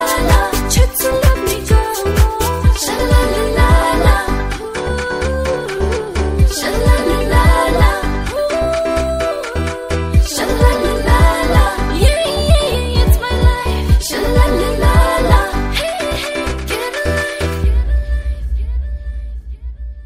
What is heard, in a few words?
bhutanese ringtone